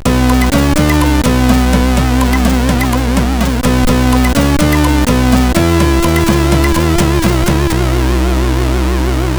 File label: Jumping Jack, CSG8580R5; Andy